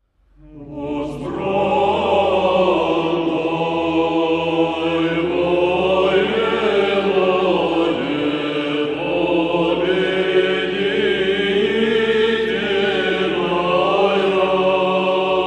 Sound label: Byzantine chant, Church Choristers; To Thee O Theotokos